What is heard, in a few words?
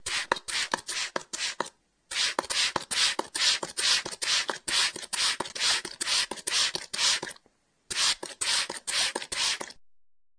fx spray